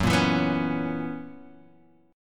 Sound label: F sharp 11th F sharp Guitar Standard; strummed; JGuitar